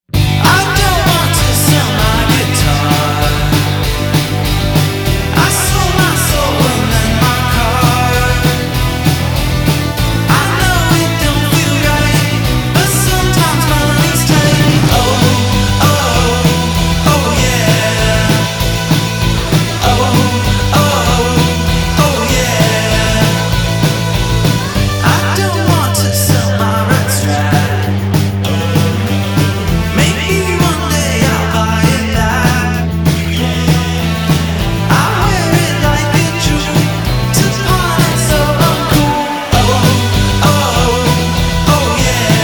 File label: Fender Strat; Indie